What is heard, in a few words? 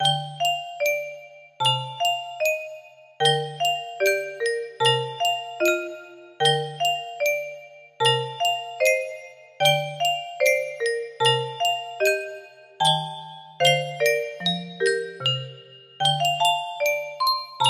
music box version